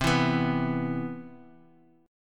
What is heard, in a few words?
C Major 7th, chord; CM7 strummed; JGuitar